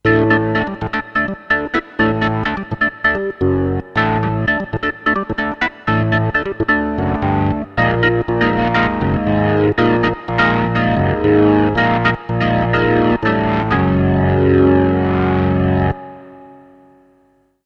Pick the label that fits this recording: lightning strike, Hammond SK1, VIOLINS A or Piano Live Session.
Hammond SK1